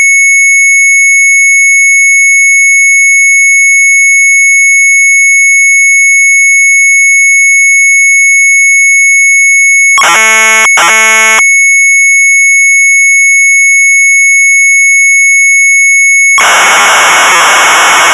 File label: Sharp MZ800 Tape; MANNETJE; retromelsarchive